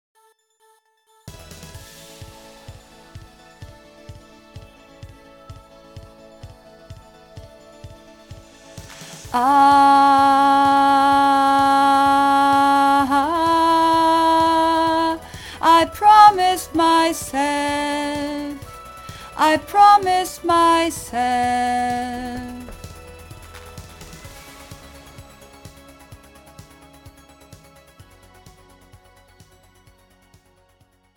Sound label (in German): Sopran Nur Bridge